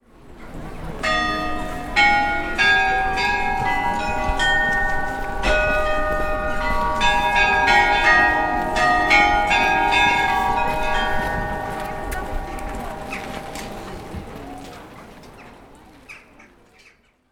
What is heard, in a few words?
chimes; sergiyevposad